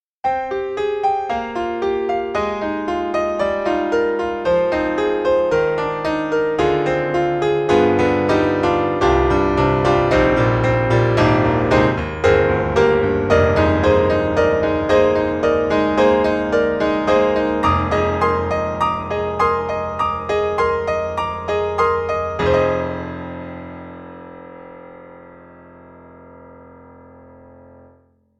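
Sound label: Bosendorfer 290 Close Rock Arena, piano; Steinberg The Grand 3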